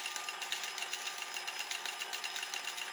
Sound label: intro LOOP; cashomatic